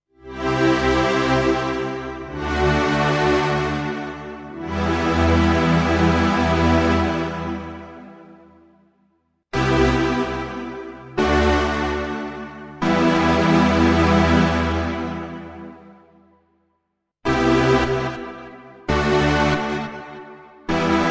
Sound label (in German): FM PWM Pad vorher nachher